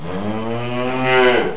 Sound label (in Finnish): lehma; suomenkielistä